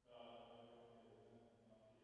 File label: 480 Rev